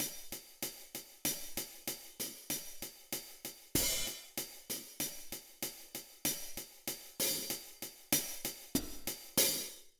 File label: Drumbeat hat; Mixter Plus Drumbeat